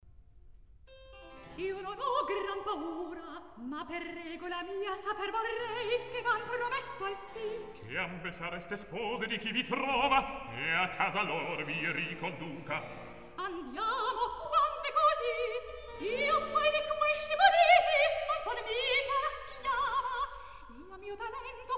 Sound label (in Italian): Recitativo; Io non ho gran paura; Mozart; La Finta Semplice